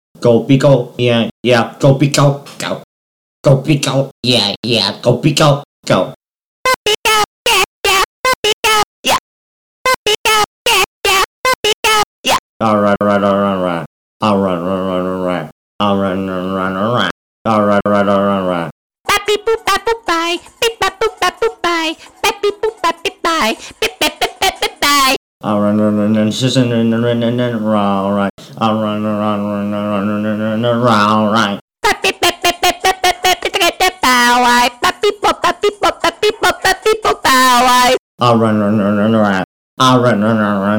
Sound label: Voices; Tobee Night Funkin PSYCH; pico